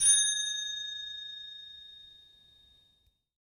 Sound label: Motion Design Bell; SoundsCrate